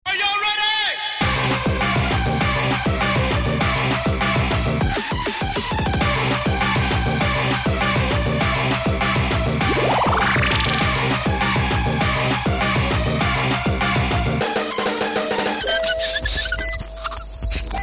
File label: Break Disco Foot